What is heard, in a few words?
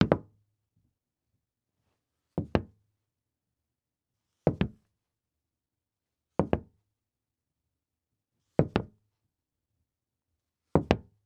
Foley Footstep Cowboy Boots Slow Walking On Hollow Wood Surface; Foley Footsteps